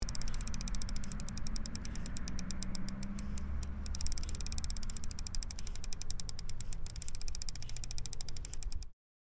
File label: Bicycle freewheel spinning outside perspective